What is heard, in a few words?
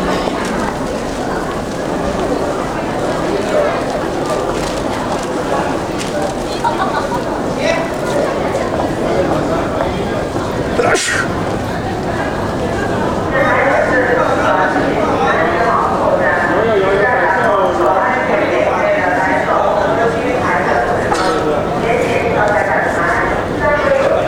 Beijing subway sounds